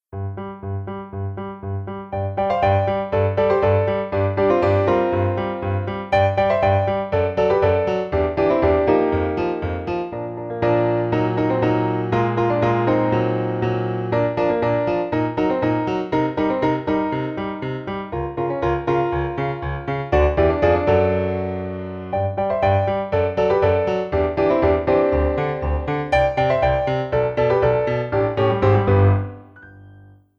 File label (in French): Piano seul